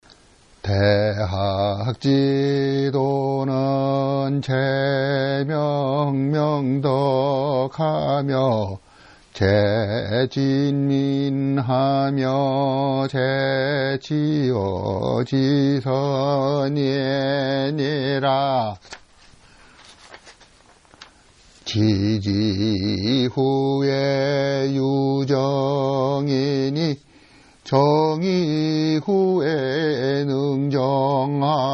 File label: great learning chant